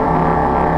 door mid; lmctf

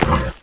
step; xatrix; gek

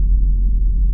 bfg hum, weapons

